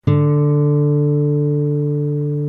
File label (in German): gitarre d4